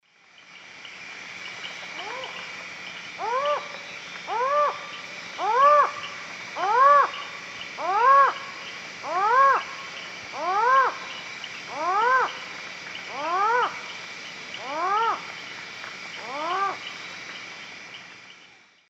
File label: Ciccaba huhula, call; S. America; Eastern Lowlands